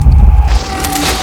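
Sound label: beam, weapons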